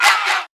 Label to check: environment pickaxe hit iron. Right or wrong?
Wrong